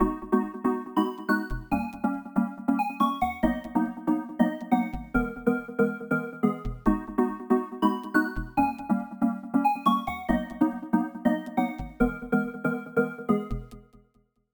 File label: call jingle